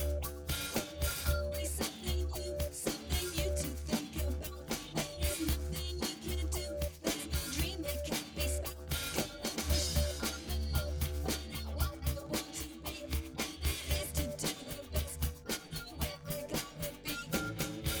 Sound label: drums; SiSEC11